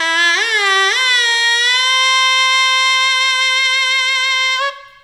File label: World Lick